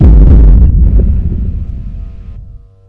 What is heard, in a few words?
thumper hit; hl2